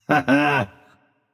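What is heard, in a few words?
nonverbal heh heh; smite; voicepack; merlin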